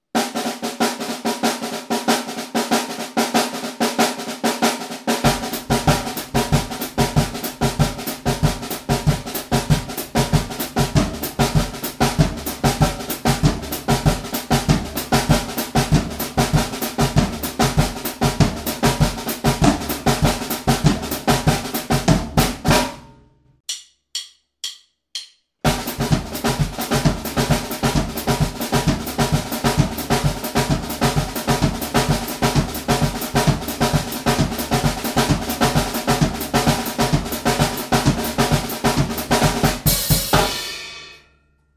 samba batterie feeling batucada

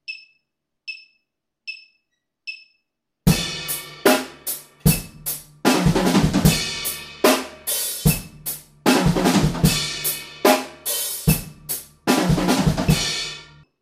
break triples croches

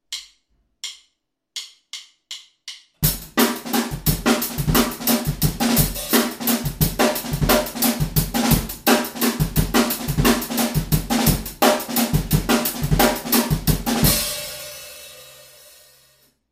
jungle complet

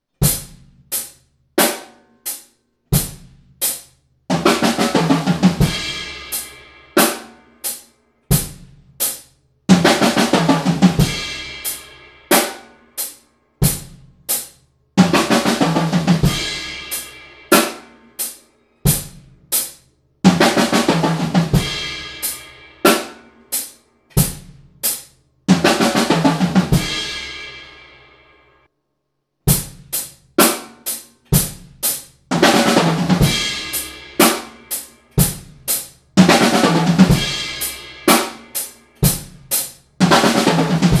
break triples croches; break passe partout episode 1

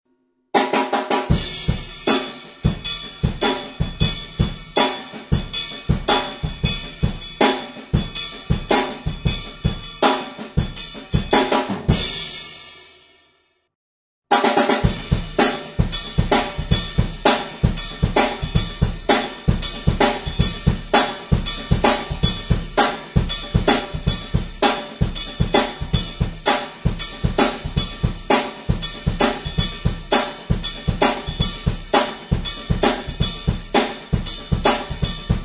rythme complet

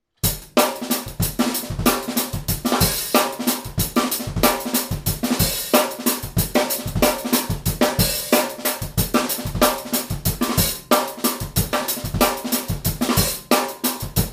rythmes incontournable, batteur

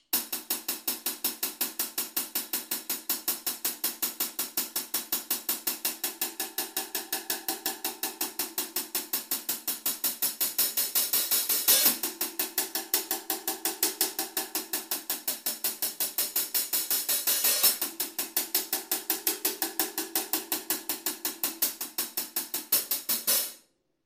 jungle pitch charlet

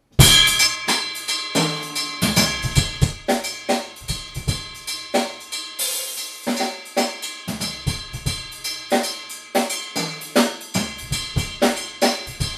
exemple solo avec cascara

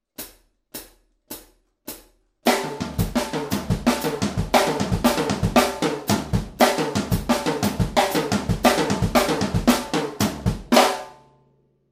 break passe partout, batteur

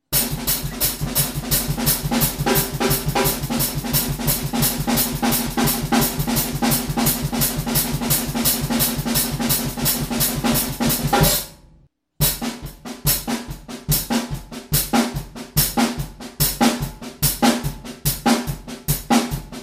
jungle loop grosse caisse

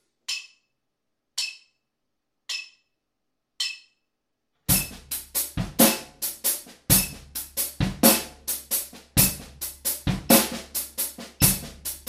groove quintolet

